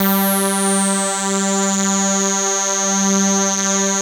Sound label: SPLIT SWP G4